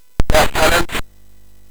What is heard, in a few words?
Jump Jet, sid MOS6581R4; hvsc